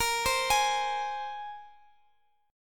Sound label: A sharp 7th Suspended 2nd down Guitar DADGAD